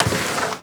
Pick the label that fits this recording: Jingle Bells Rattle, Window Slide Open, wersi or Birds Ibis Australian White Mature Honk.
Window Slide Open